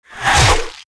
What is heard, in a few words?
Giant Red Condor skill